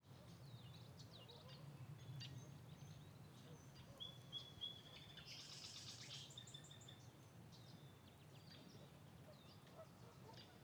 Amb, Forest, Birds, Geese, Wind, Spring in the Pacific Northwest; Raconteur Sound; Ambiences